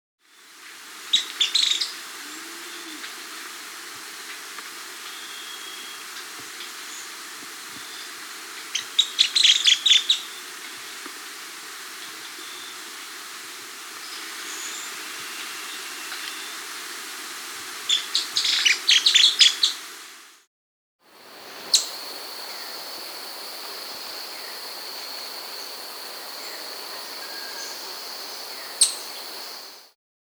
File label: Flavescent Flycatcher; Myiophobus Fl; S. America